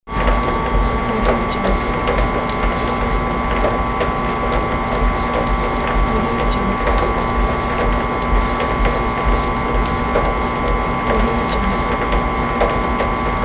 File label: factory machine hum